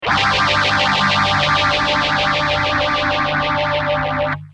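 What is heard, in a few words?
guitar Systech phaser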